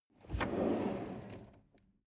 drawer medium open draw

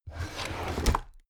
drawer cabinet crammed open heavy

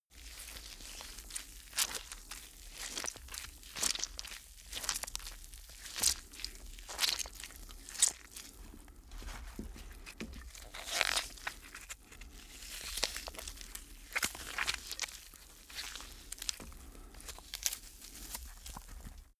blood squish

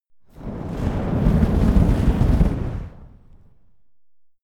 flamethrower medium whoosh single

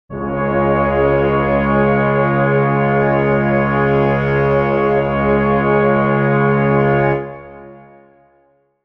brass chord c sharp major

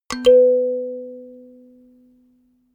notification kalimba octave up

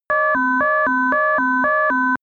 sci fi alarm small personal beep polyphonic

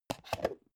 plastic bowl small close

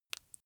flesh wet fast squish single

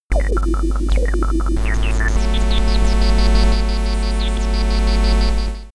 level music

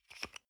toothpaste screw cup open soft single

